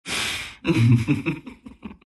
laugh; Winter Wyvern

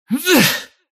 cast pain; dota; kez

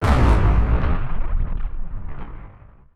overload; storm spirit

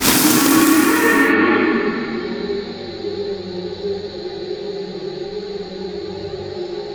insatiable hunger; dota; broodmother

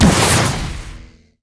frost arrow; weapons; dota; drow ranger

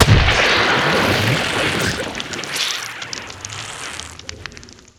goo target; weapons; dota; bristlebog

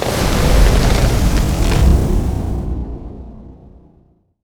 living armor; treant

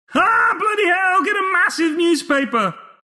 aaahhhh; announcer dlc pflax

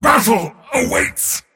move pain; dota; mars

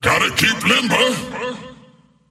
swipe; skeleton king

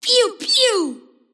lasthit pain; kidvoker; dota; invoker

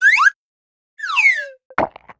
taunt; dota; phoenix

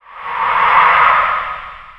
death ghost, creep deaths; dota

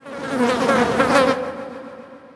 decay transfer; undying

